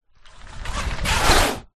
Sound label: household blind fabric old fashioned up open